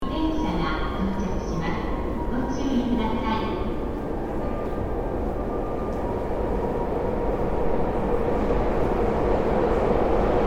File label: metro arriving